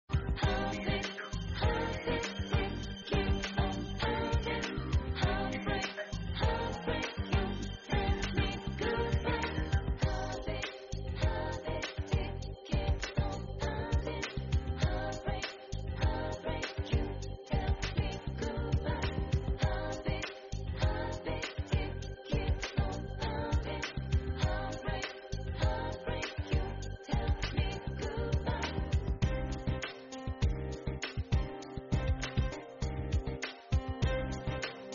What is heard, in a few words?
heartbeat